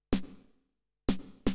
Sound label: SNARE HOUSE 125, Drum; eJay